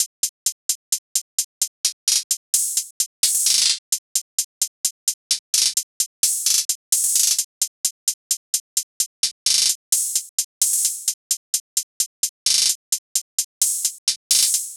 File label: beat loop hihat 130